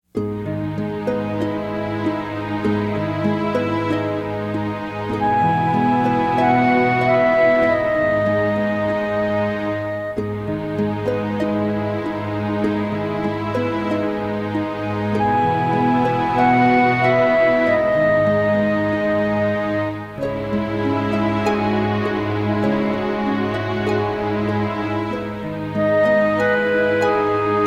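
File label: Campaign Music; heroes of might magic iii hd 2015 ios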